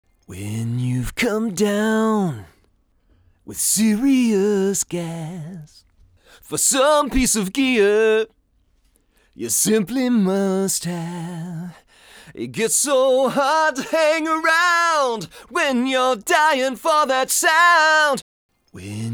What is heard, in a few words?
mic Ld Vocal Comp